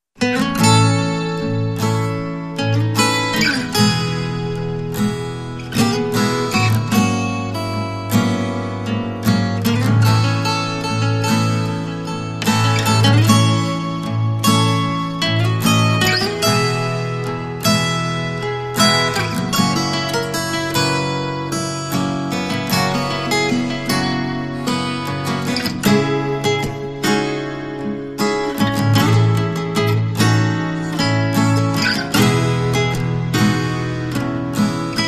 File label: Guitars